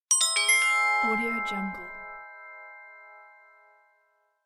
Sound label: magic crystal